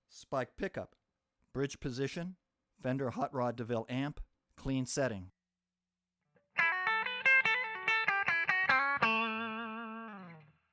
spike bridge hotrod clean

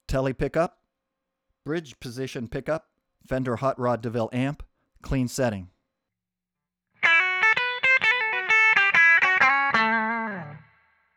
tele bridge hotrod clean, guitars

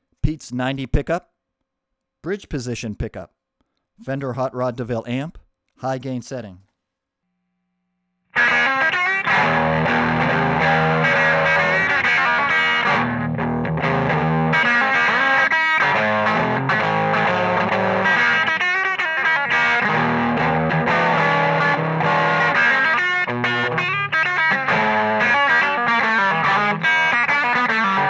bridge hot high

